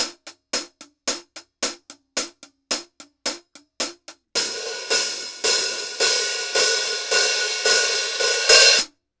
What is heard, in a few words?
X-Series Hi-Hats